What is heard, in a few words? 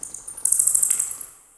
bat; dota; ambient; soundscapes; diretide